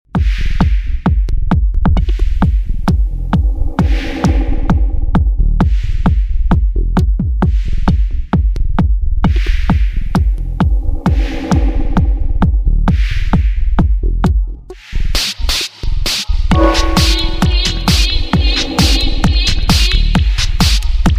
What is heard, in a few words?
glass container